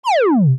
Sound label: Line graph decrease animation infographics